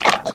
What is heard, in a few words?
pseudodog chew